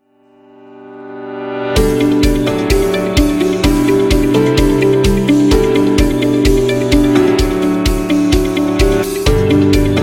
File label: Uplifting Rock; cooking show